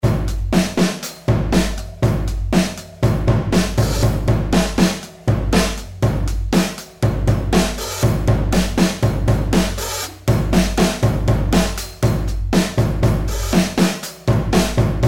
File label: MIDI Grooves